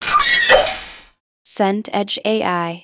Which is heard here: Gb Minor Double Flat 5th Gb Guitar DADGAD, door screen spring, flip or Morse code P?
door screen spring